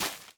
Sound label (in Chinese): 大型垂滴叶踩踏声